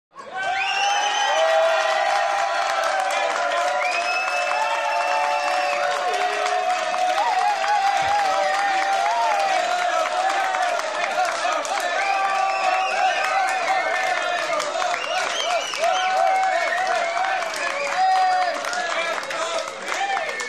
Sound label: Audience Applause Ch